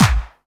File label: KIT, Dance Mega Drums; Best Service